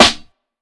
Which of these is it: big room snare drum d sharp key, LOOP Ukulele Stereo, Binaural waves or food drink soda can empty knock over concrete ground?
big room snare drum d sharp key